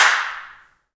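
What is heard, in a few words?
hand clap; Roland L-CDX-01; Clap & Snap; Clap Modules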